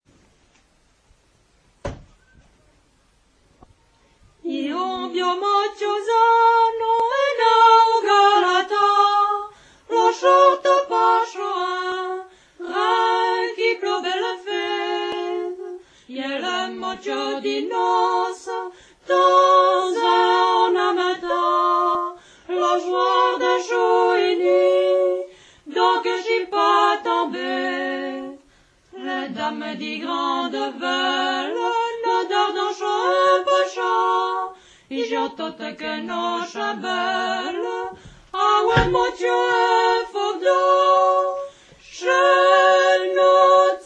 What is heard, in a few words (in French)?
La chanson des mouchoirs patois d Heremence; patois valaisans; Li Tsaudric